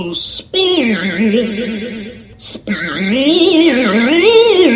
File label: spin